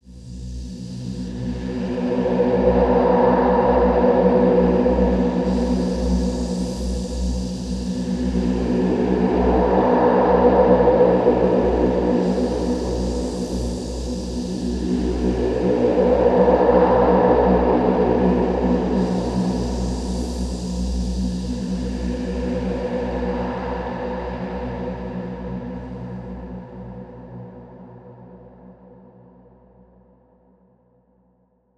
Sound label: Atmosphere B 142 BPM, Psytrance; Orange Cloud; Ghosthack; Psytrance Visions Volume 2